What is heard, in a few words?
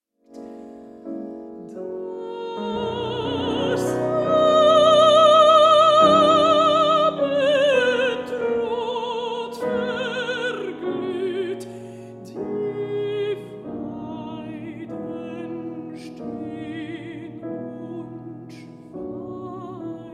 songs mezzo soprano piano; op 50; sibelius songs